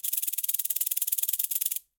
Cabasa FX; Latin Percussion; Drums; Drum Hits; Shaker; Ableton; Factory Packs

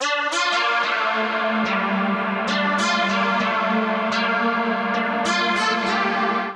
Lead G Minor 146 bpm; MIDI Clips; Ableton; Skitter and Step; The Fear

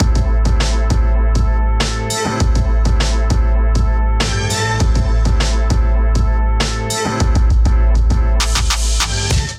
Kit Melodic 100 bpm, Drums; MIDI Clips; Safe House; Ableton; Chop and Swing

